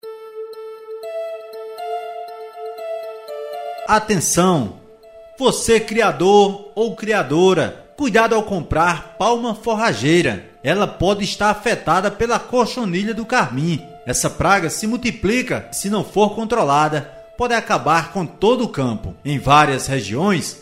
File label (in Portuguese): Vinheta com musica; cochonilha do carmim